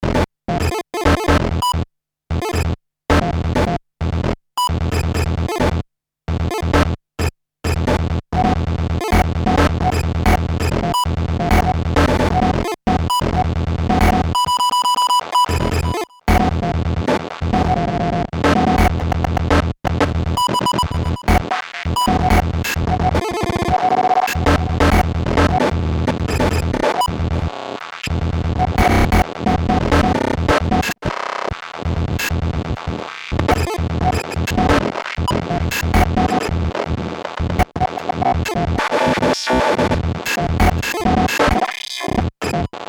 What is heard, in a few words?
Korg Kaoss Replay Synthesizer Resampling Gated